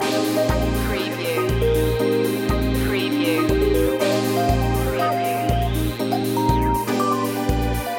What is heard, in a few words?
black lounge loop